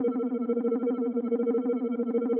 freespin summary counter loop; Rabcat; Classic 243

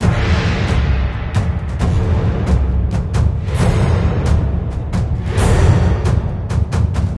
ReSpin Music Loop; 108 heroes Multiplier Fortunes Desktop; Mahi Gaming

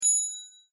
wink; Skillzgaming; mega Money Rush Desktop

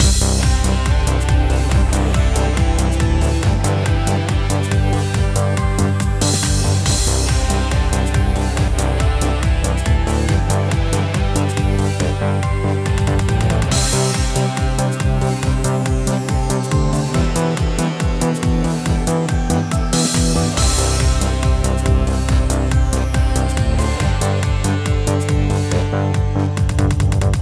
Music Free Spins Loop; Action Ops Snow And Sable

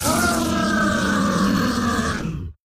attack; Skillzgaming; battle Mania Desktop

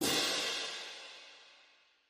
normal win; Skillzgaming; battle Mania Desktop

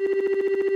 Base Tally Loop

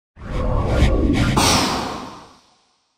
enter positive fast; medallion; Fantasma

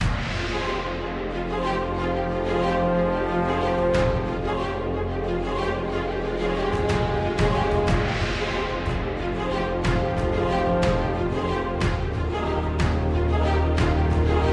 Music Free Spin Loop; forbidden Throne Desktop; Mahi Gaming

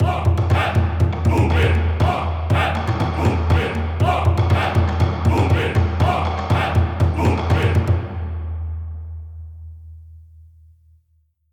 Bonus Outro; Wu Xing

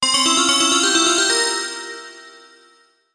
Win; Temple of Luxor

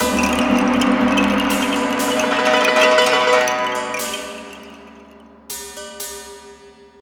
Win; Wu Xing; genesis

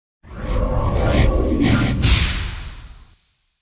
enter positive slow; medallion; Fantasma

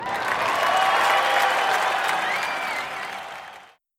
Bonus Positive; genesis; euro Golden Cup

